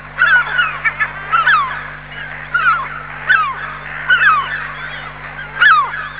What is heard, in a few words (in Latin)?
larus argentatus